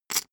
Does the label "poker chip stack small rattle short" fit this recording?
Yes